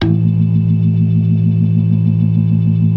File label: ORGAN, 4 DRAWBARS; Keith Emerson Signature Series